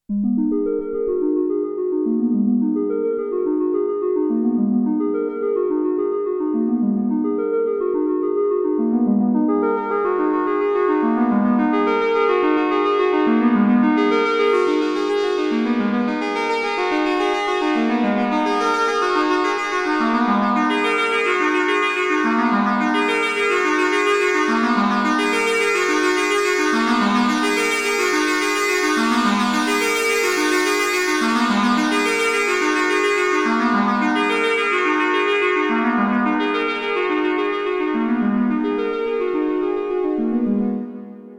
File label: Behringer Pro 800 Poly Modulation